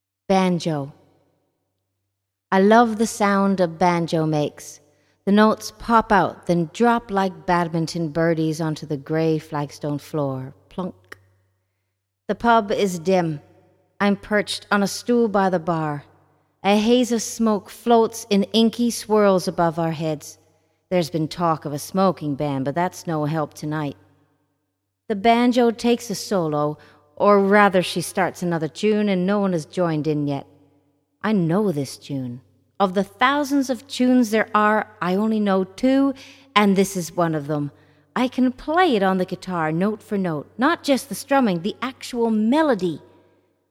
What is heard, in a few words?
BANJO